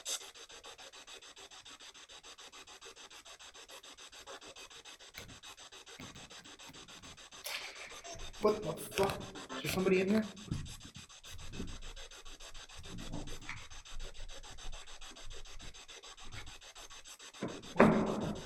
Balcony Spirit Box; Spirit Box 2; At :07, there was an odd noise of something falling to the floor; american renaissance church investigation

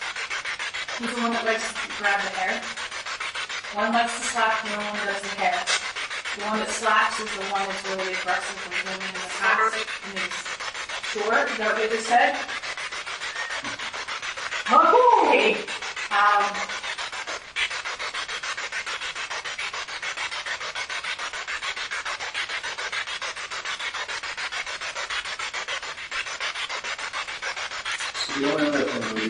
Civil War Wing Basement Spirit Box; madison seminary investigation

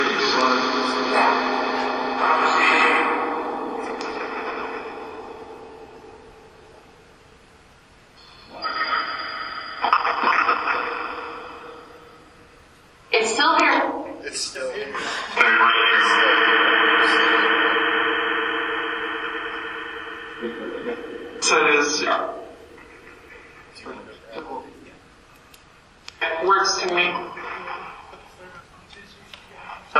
Phasma Box; beaver area high school investigation

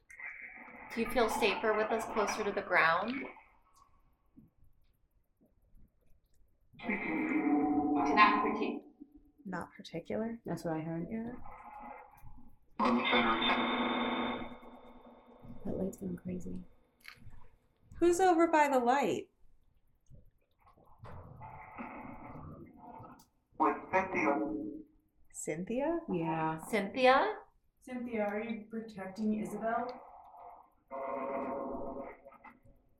Barbershop EVP Girls Only

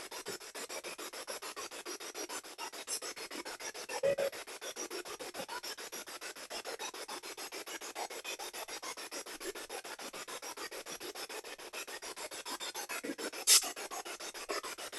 Spirit Box; Demon Room

